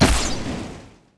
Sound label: bow flame; matmos interactions